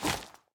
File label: flap